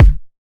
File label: bassy kick drum g key